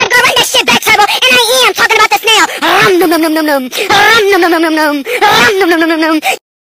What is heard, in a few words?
little kid scream